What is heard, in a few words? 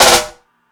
FX Sounds, Drum Machines